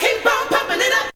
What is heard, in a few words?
VOCS; Zero G; Man Machine